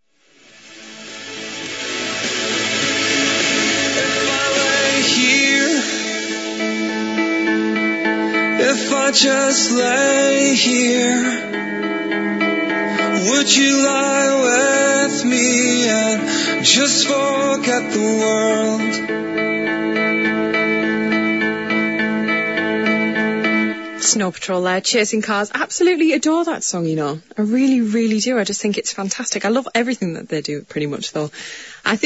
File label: interval signal; bbc tees